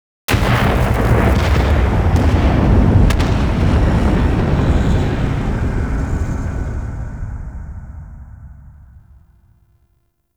Ship Exploded; Warframe; Protea Quest

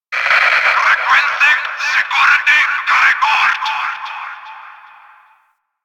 Fortress Interior Notification; Ambience; Warframe; Grineer Fortress

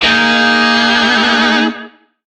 Shawzin Yo Chord H; Music; DE; Warframe; Lizzie Shawzin

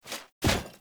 Foley Heal Start; Banneret; Sinecure; Enemy

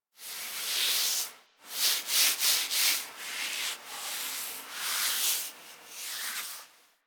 foley interact scratch sit forward; Enemies; Warframe; Catbrow

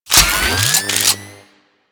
Air Pistol Reload; Warframe; Corpus

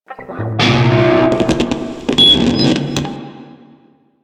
Shawzin Un Equip; Warframe; Narmer Shawzin

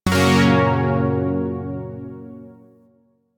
Shawzin Yo Chord F; Chords; Warframe; Zariman

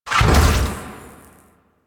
Execute Teleport; Warframe; Warframes; Pax Duviricus

